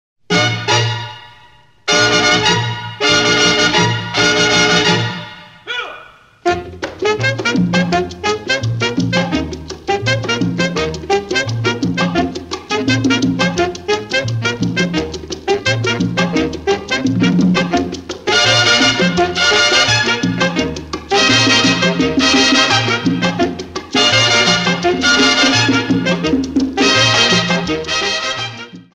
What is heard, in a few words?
polyphonic